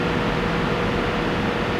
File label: tone; tf; Source Engine; video games; ambient; lair